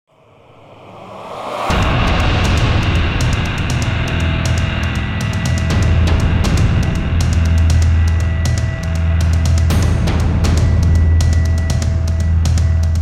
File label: Epic 120BPM, Cinematic Trailer Music; BlueFX; Epic Soundtracks